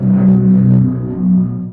propeller; TumikiFighters

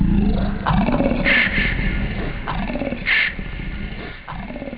orgasm; speech

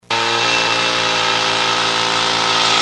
running, machine motor

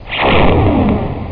Thunder

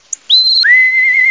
whistler

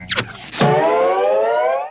drop rise; Crazy Paint: On Location

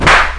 Whip; MacFoxes Adult Game; Girl 2

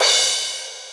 cym china, midi; cubic

